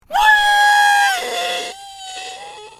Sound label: Pig fear; bad piggies; android; mobile; windows